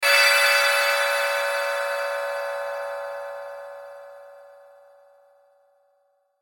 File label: Hit Bells Ring Shimmer; Production Element Imaging Element Accent Transition